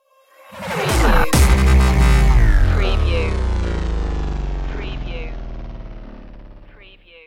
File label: destroyed stinger